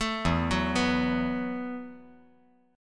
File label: E Suspended 2nd Suspended 4th; 2,4,2,2; down; 5G,4C,5E,5A